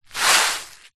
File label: footstep single scrape scuff sandal concrete light dirt; foley; zapsplat